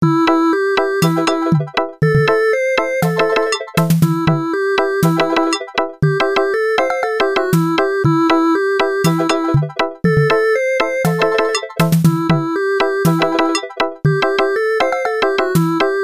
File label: Ringin Reggae